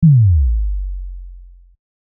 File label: combo break; Seoul v10